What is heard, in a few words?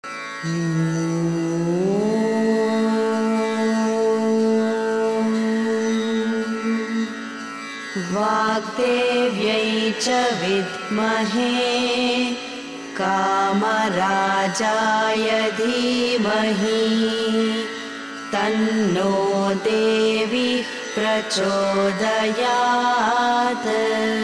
saraswati gayatri female voice